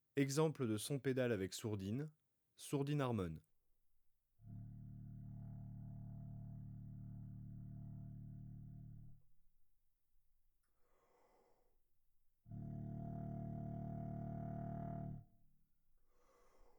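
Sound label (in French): exemple de sons pédales avec sourdine harmon, trombone; Textures; Sons complexes sonores; traite orchestration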